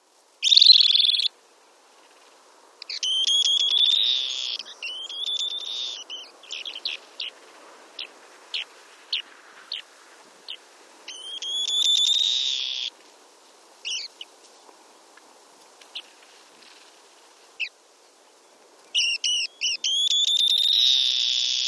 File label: Western Sandpiper